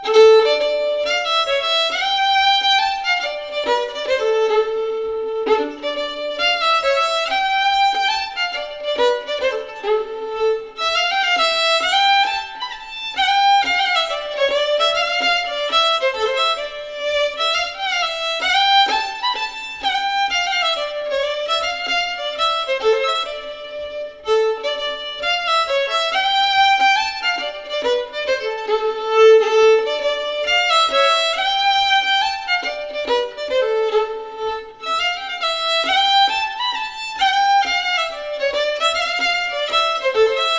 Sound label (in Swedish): Skogspellepolskan